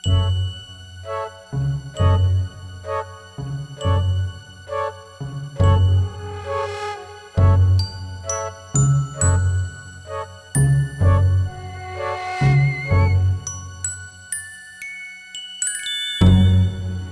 Suspence Sting

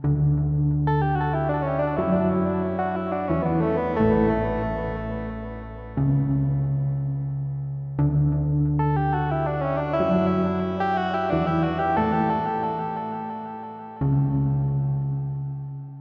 Synth

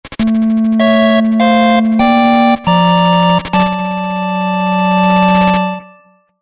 Boss Clear Fanfare (8-Bit); The Lady

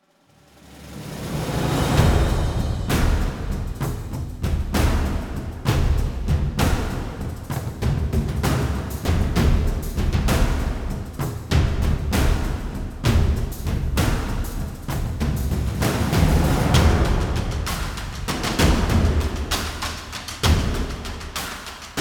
Blizzard Calm Drums Only

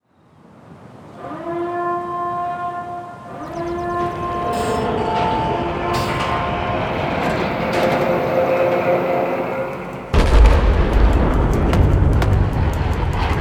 Music Loading; Battlefield 4; Caspian

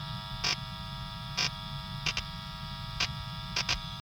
Ambience lightbulb; adams venture origins

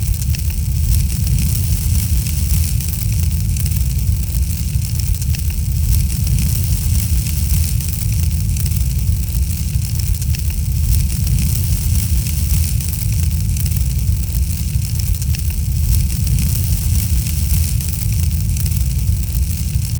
torch

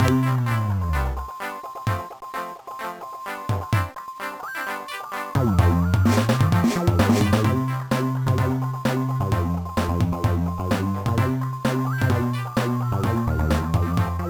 Instrumental

